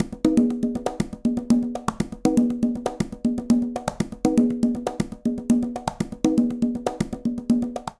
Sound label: Candombe Conga Tumba 120bpm, Latin Percussion; Ableton; Factory Packs